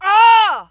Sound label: anger